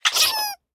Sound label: Wolpertinger Death